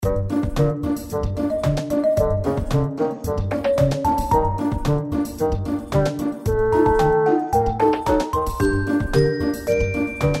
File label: bomb music; xunlian game; 運送炸彈